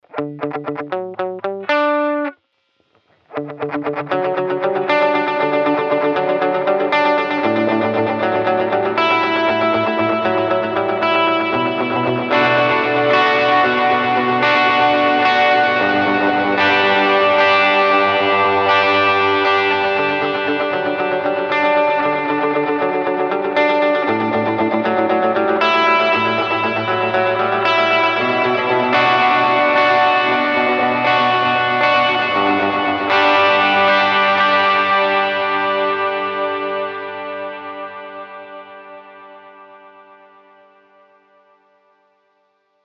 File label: Multiply TapTempo